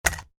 Navigate: Short SFX For Navigating Between Options; Multimedia; Buttons; Stuart Duffield